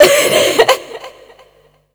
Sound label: FEMALE, Dance Vocals